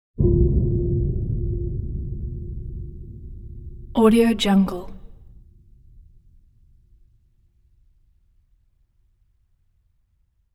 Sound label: low rumbling pipe boom; sound-ideas